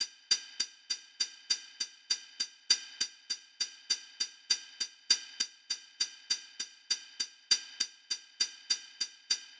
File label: Hihat Loop BPM 100; Bihu; Hella; waves of bihu vol 2; Gully Loops